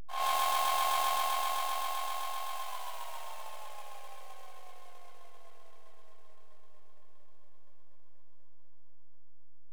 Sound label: Fx; Alesis DM-PRO